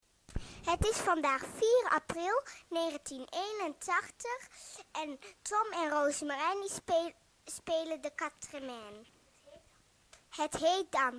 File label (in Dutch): piano; muziek cassettebandje liedjes